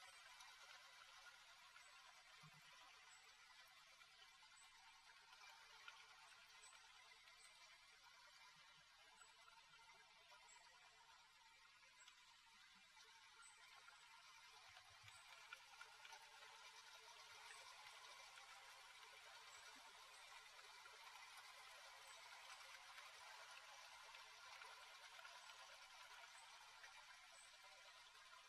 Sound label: Rain